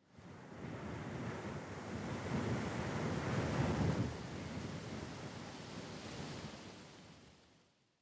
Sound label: wind gust; factorio